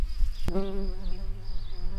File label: bumblebees, Hypnorum workers